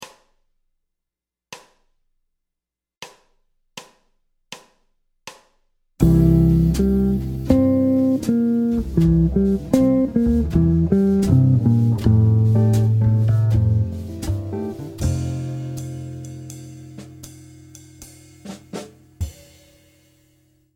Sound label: C7 C7 F7 80 bpm, guitare jazz